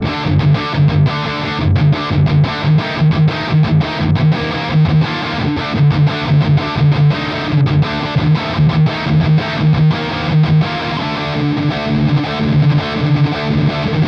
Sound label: REVV G20 High Gain